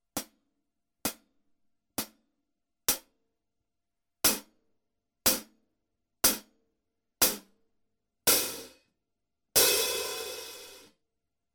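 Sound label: Hi-Hat Paiste Traditional Medium 13, cymbales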